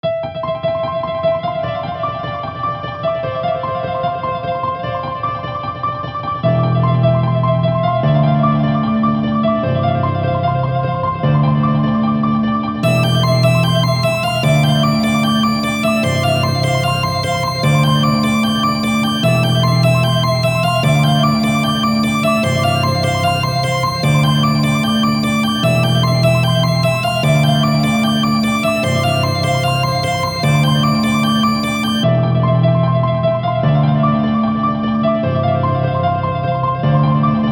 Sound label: xiaomi ringtone remix